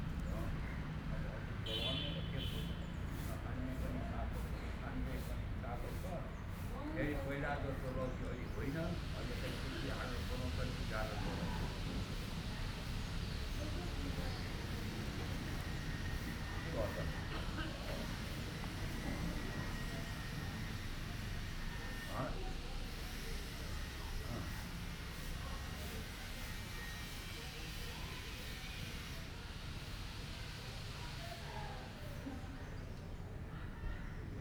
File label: Pharping; Boudha, Kathmandu, Nepal